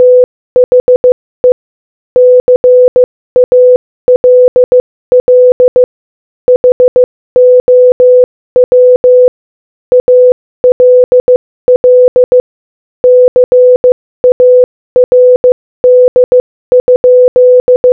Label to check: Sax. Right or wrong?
Wrong